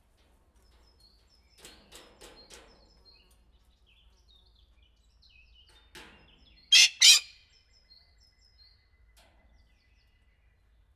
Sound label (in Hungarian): hangoskodo madar, aranyfacan; felsolajos zoo 2015; cardioid